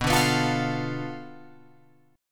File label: B Minor 7th Flat 5th B down Guitar Open F; Bm7b5 strummed; JGuitar